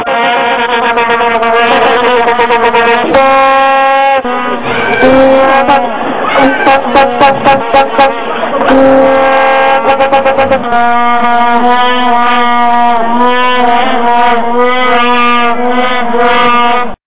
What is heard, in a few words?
vuvuzela